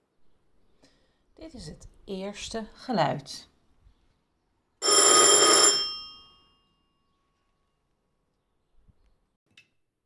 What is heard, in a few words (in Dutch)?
Geluiden in huis